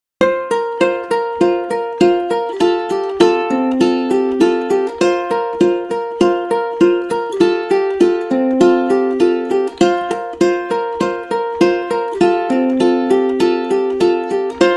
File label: along the beach ukulele